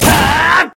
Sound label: throw ball